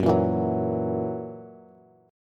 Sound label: F sharp 7th, Bass; strummed; JGuitar